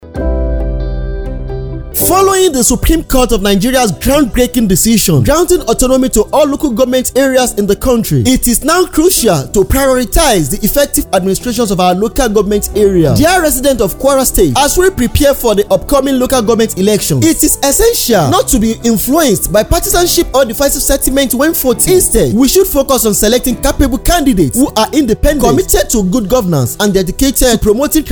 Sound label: Election Jingle ENGLISH